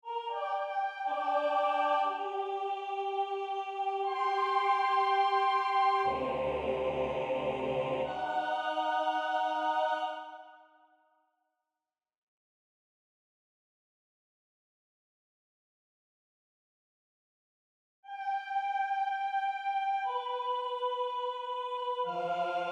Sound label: A Blessing of the Bells AI accompaniment